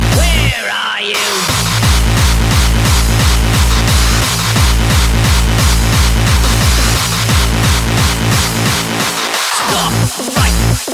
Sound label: Earthquake